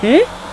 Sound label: hmmm; People; Sound Library 2000; Wayzata Technology